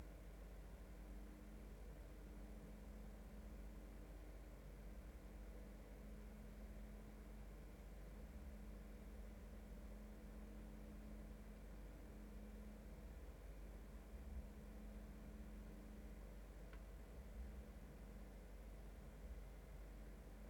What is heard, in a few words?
noise